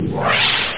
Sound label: Whoosh; CoinMania